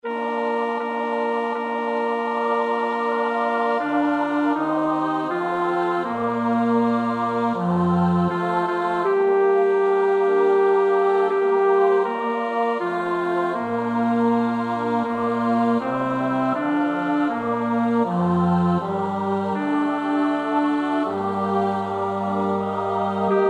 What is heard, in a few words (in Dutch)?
bas; Bach (Johann Sebastiaan); BWV 027; 6 Welt, ade! ich bin dein müde